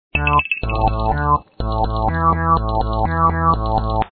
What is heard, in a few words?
Menu Loop; SpongeBob SquarePants Sea Monster Smoosh